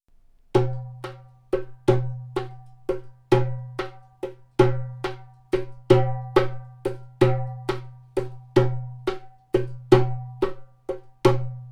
darb normal dum tek tek, darbuka, normal Room, percussion